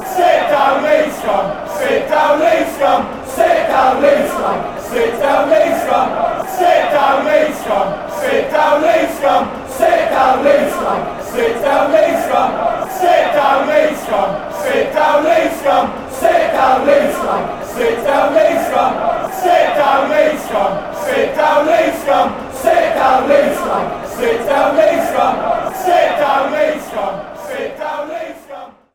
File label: fanchants; sit down leeds scum